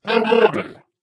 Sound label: ballbot come here